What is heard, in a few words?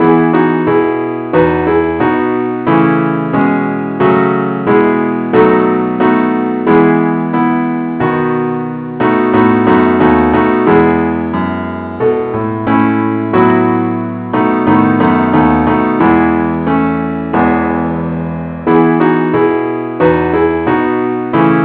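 keyboard Only key e, a Welsh Folk Tune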